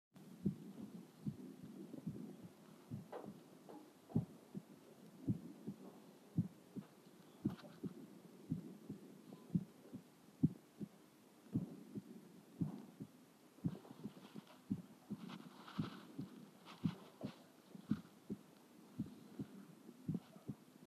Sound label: Heart Normal 60 rpm